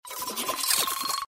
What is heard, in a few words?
lights whirl; Fondant Favourites StarTrail; Eyecon